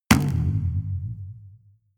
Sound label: spring